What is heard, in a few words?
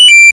journal rotate beep